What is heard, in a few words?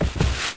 roll